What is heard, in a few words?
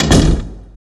metal door stop; gesource